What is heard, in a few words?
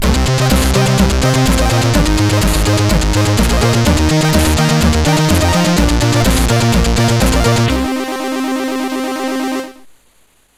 sid CSG8580R5; Abyssonaut v2; hvsc